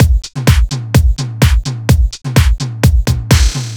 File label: Full Drums, 127bpm, Nu Dance Electro; Combi Loops; Night In Heaven